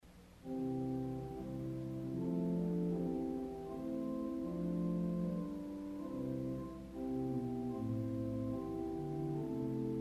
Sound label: Flute Conique, organ stops